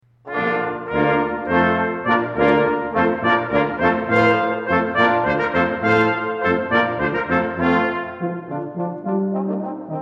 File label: hornpipe